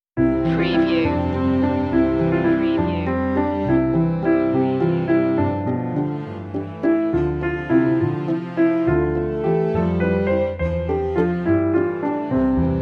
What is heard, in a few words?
hey diddle diddle instr